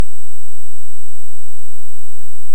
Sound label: Hydrophone; OneOcean